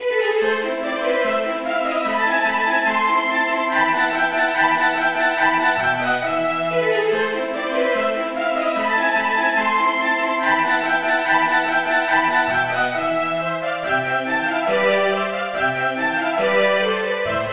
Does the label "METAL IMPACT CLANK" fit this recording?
No